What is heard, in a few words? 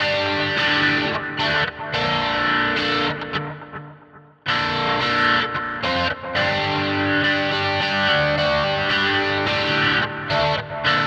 Nux MG-30 Super Reverb Rotary